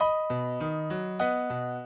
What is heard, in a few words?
minuet, mozart